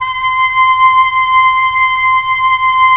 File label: OB, Oboe; Roland - LA Composer vol. 4